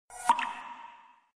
Water Drop

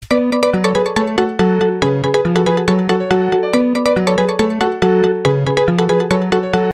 samba Sms; Nokia X9

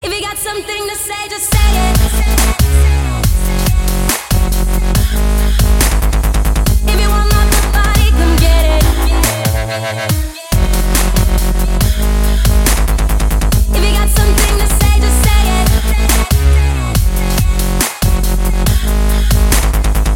Dubstep

